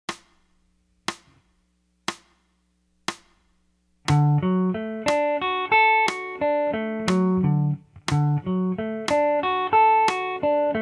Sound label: arpeggios; chords